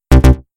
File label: fail